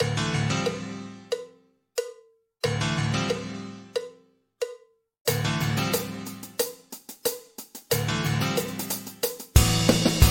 Dungeon, bgm

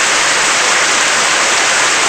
Storm

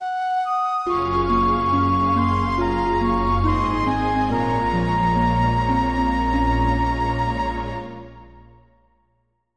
Defeat; ycrpg

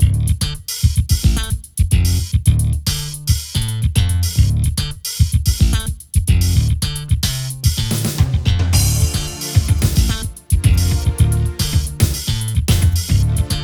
Dungeon, bgm

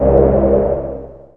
Blind

